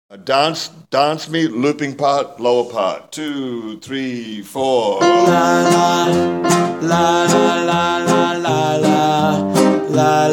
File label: DANCE ME LOOP LOW ENDING